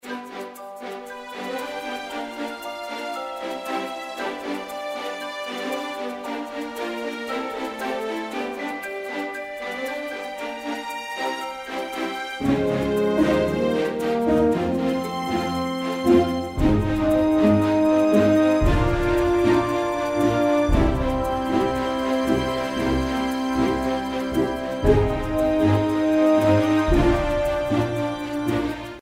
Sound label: Minigame Walk; Doodlekingdom; Doodle God Puzzle Collection